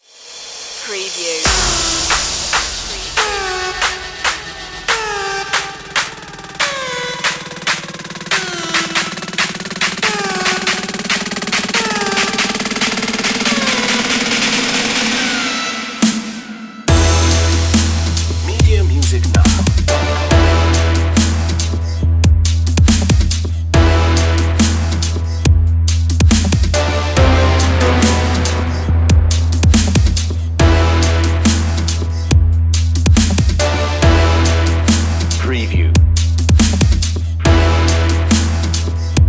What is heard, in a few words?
no lead synth